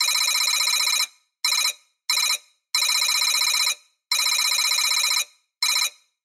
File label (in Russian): elektronnye zvuki peredachi dannykh